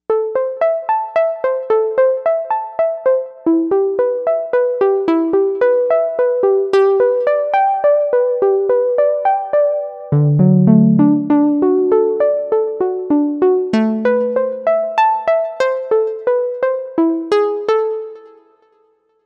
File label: Dulhammer Harp, Novation UltraNova; Factory Patches